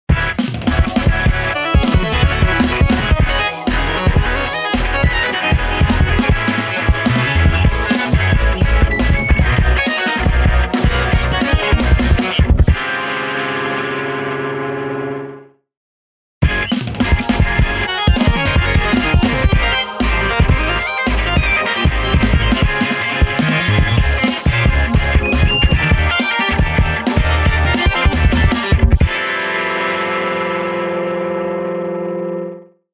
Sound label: cleaner snares bits